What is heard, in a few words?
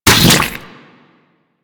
Venom Tick; Warframe; Saryn